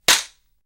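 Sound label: Face Slaps Heavy Sharp; flesh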